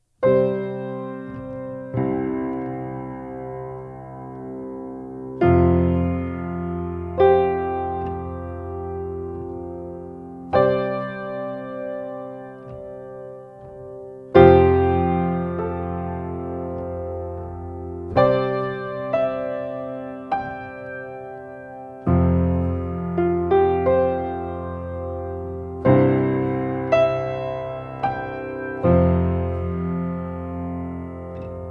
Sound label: Piano; AMI V78M WPK